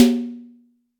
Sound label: big tom b key